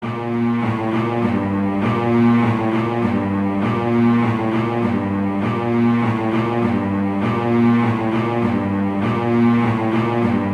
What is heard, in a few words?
Carol of the Bells